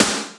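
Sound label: Splat S, Boss DR660 snare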